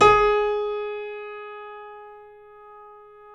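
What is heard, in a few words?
KEY A flat 3, YC7 Piano mf; Roland L-CD701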